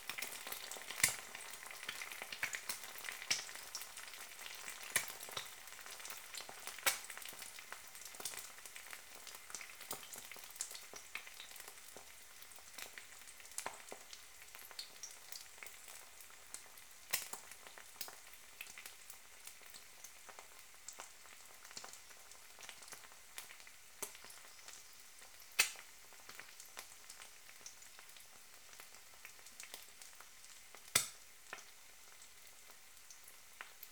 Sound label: frying